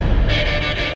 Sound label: Notification